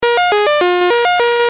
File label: gameover; digital invader